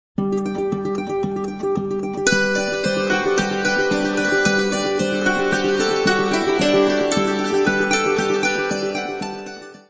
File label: dulcimer